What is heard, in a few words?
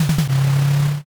engine start